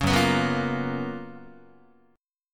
Gb Major 11th Guitar; GbM11 strummed

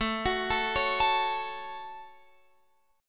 A 6th, Guitar, Open F; A6 arpeggiated

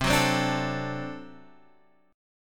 D 7th, C

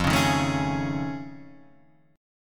F 7th Sharp 5th Sharp 9th F; F7#5#9 strummed

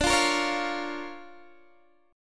D Minor Major 7th Sharp 5th, D, Guitar, Open D

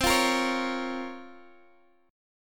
Bb Minor C, Bbm/C; Bbm/C strummed

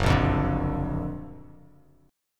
Gb Augmented Major 7th Gb down Bass Standard; Gb+M7 strummed

